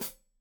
Hat Foot, Hi-Hat, Cymbals Stereo

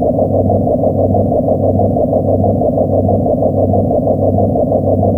solo, drum loop; Yamaha DTXpress; LoMsolo

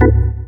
org jazz, Stabs; E Lab; XTc Files of House

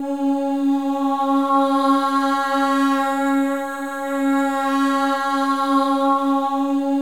MOVING V4, Symphony of Voices; Spectrasonics

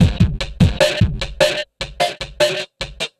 Chirrp Beat, Drum N Bass; Sonic Foundry (Sony Creative Software); Knutrix Drum & Bass

